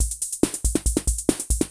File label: 140 POPS; Best Service; XX Large Most Wanted; Sample CDs